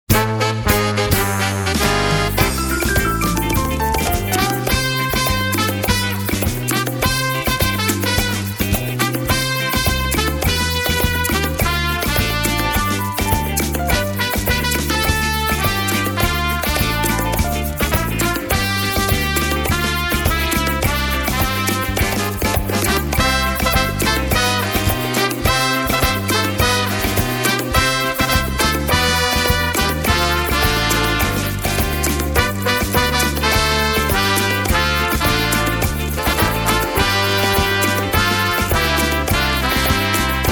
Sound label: Salsa